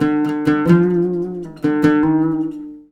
LUTH; USB Soundscan vol.43 - ArabianTraditions; AKAI

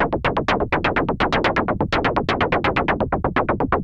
SH101 Clocked LFO Rhythm (125BPM)

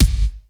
BG KICK, METALIC DRM; Crypton - DrumBase5000; METALIC DRM2

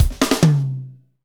FUNK; Northstar - Drumscapes Roland

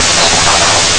rain; Amiga Soundtracker Sample Packs

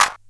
Bent Clap

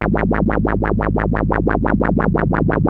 ARP 2600 F#2, synthesizer; Hollywood Edge - Giorgio Moroder Rare Synthesizer Collection

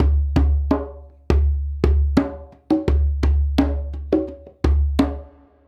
Djembe; Sonic Foundry (Sony Creative Software); Essential Percussion; Drums

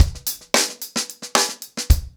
Hip Hop 110BPM, acoustic drums drumloops

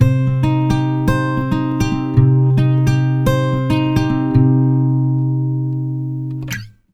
110 MIN PICK C, Acoustic Guitar Loops; USB Soundscan vol.04 - Electric & Acoustic Guitar Loops; AKAI

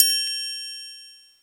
triangle, Percussion; SN-R8-01 Contemporary

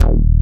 low end bass; Dance; BASS SOUNDS; Masterbits; Dance Trax

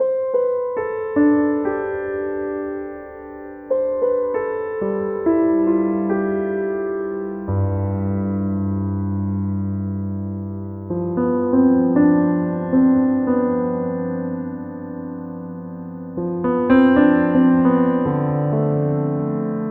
Piano

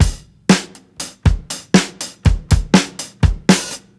FUNKY, breaks, drums; Basement drums vol 2

